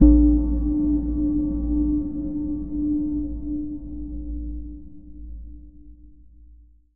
editor blips; Source Engine; Portal 2

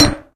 ball buster hit; tf

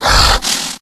hulk breathe; Left 4 Dead; Source Engine; tank

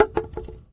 cardboard cup impact hard; physics; source engine; left 4 dead 2

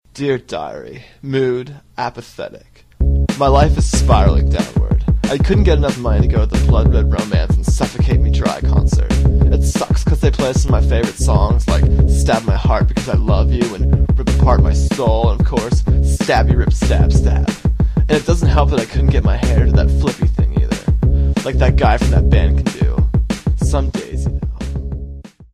emo song; Source Engine

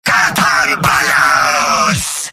battlecry; demoman; video games; source engine; tf; mvm

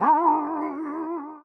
rockstar; video games; player; taunt; source engine; tf

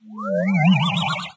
science exit windows; windows 9x; sound schemes

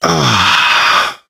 pain relief sigh, survivor voice, manager; source engine; left 4 dead 2

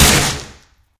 sniper shoot, weapons; bazaarbargain; tf

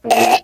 choke, biker; voice

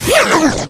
rage, infected, male

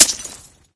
knife hit; source engine; dod

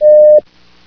biotone, ambience

